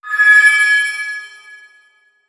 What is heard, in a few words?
Elec Magic; Sorcerer Attack